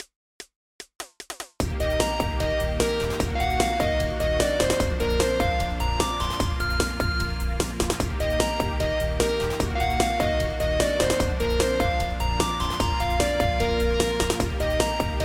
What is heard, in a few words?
BGM, pop version